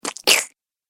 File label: Double Splat Sound; Slimy